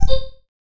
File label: Video Transmit End; effects; khadas edge2